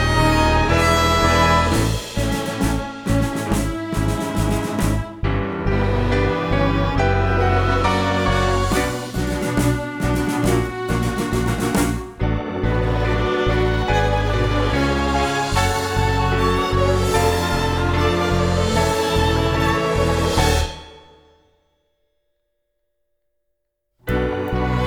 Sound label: instrumental